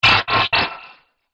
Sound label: Science Fiction Laser Sensor; Laser Sound Effect; Sci-Fi Laser Sound Effect